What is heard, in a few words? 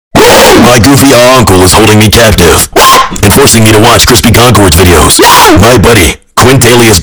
loud goofy ahh